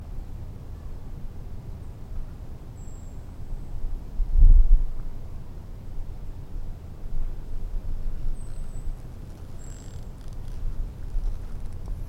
Brown creeper, birds

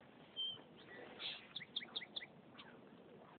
Song Sparrow song

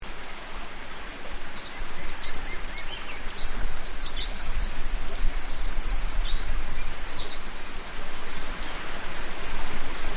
Pacific Wren song, San Mateo San Pedro Valley

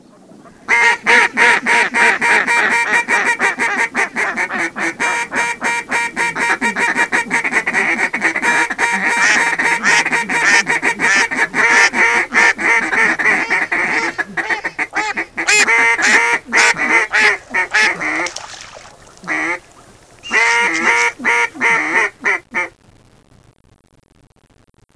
Mallard Duck Mixed Calls; birds

